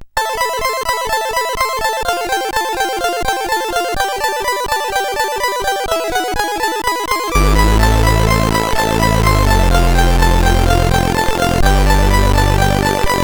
sid, CSG8580R5

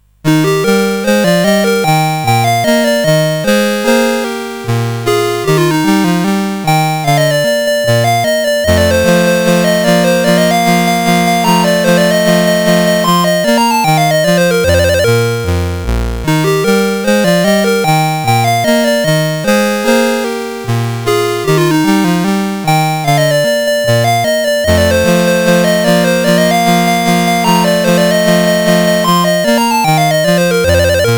March in E Flat, CSG8580R5

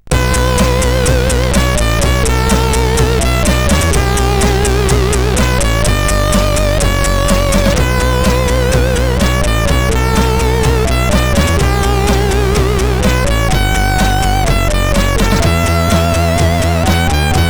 Noise, sid CSG8580R5; Fristie-Noise 02

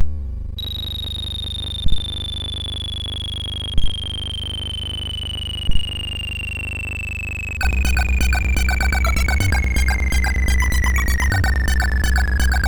Instructions, MOS6581R2; Tonka; Blitz 3000

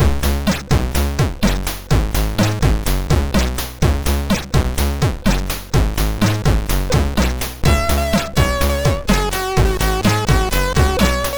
Short Tune sid MOS6581R3

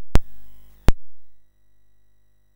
Commodore 64 Christmas BASIC MOS6581R3

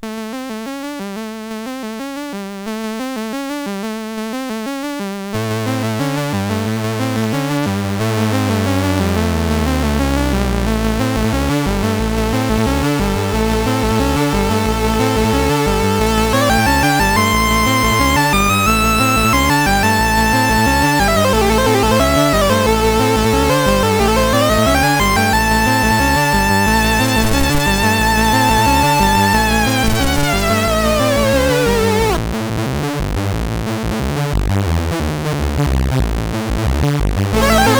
16 Note Scale, CSG8580R5

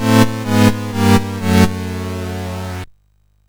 sid, MOS6581R4; Clue-Master Detective

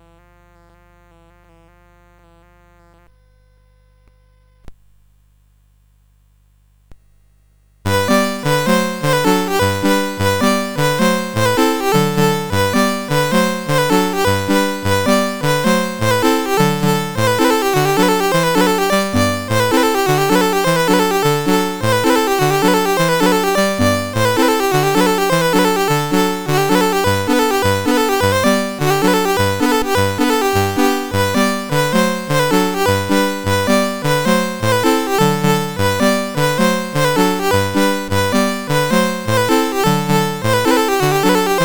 CSG8580; CSG8580R5

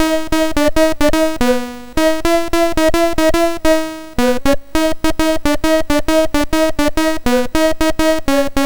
BASIC sid MOS6581R2; Auf Wiedersehen Pet; hvsc

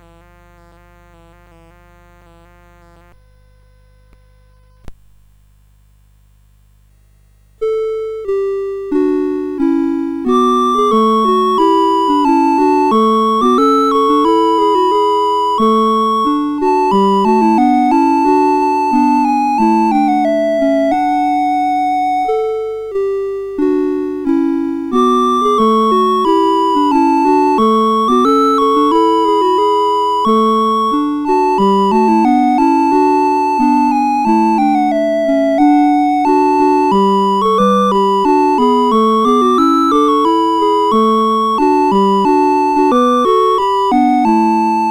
CSG8580; CSG8580R5

